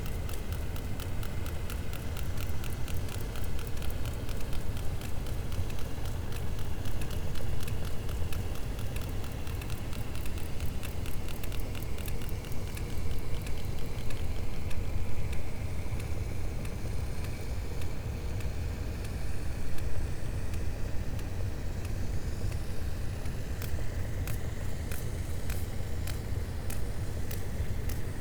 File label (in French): cachalot positif, Physeter Macrocephalus